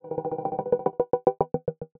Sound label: Movements; 99Sounds; The Weird Side Samples